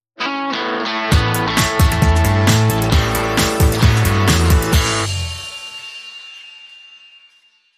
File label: Flower Fonk Sting